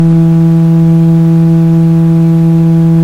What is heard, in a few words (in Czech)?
principal 8, englerovy varhany